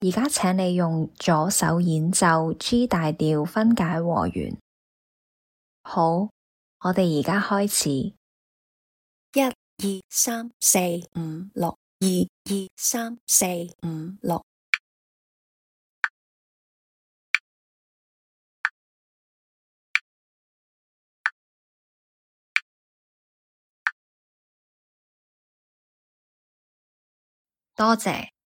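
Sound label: G major broken chord left hand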